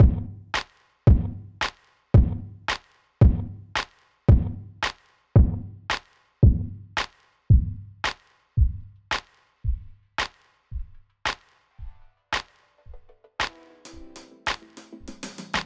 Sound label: Instrumental; Rhythm Doctor OST